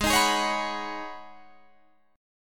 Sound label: C sharp 7th Suspended 2nd Suspended 4th G sharp